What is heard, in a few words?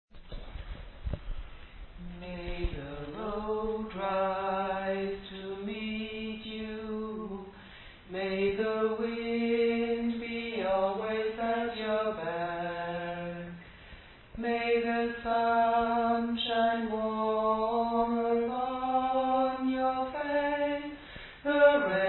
Tenor; An Irisch Blessing